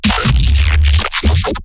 glitch stab; Virtual Riot; Glitch Stabs

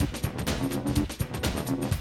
125, Classic Drum Loops

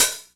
Dry Open Hat, E-MU Xtreme-Lead

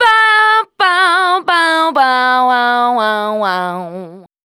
FEMALE, Dance Vocals

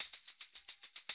110, Classic Drum Loops

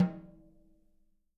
SN OFF, snares

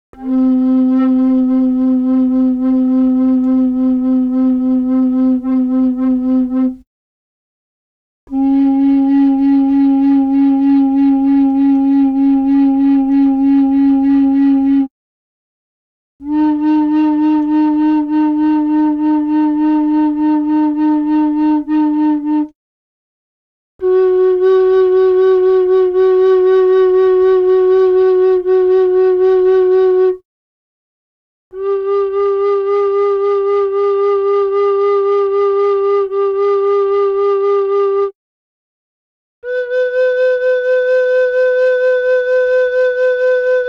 Gamelan; Propeller Island - Complete Gamelan

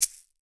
SHAKER SM, LATIN percussion; Voice Crystal - Steve Reid Definitive Percussion; LATIN SET

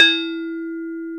BRONZE, Bronze Gamelan

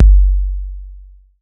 BD 808; Electronic; Wizoo - Platinum 24 Electronic

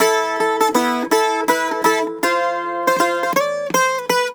Celtic Flavours; USB Soundscan vol.42; AKAI

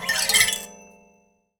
Finger Gesture, Finger Prepared Kit; Battery 3 Sample Kits

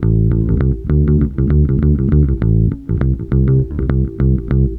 BASS, BASS GTR

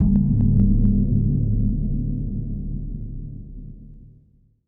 GONG DEEP, TIBET; Best Service Advanced Media Trax Modern Composer; ETH TIBET

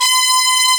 SAX C4; AKAI S-Series CD-ROM Sound Library VOL-2

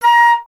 flute A#; Miroslav Vitous - Solo Instruments VOL-2; Solo lnst. II

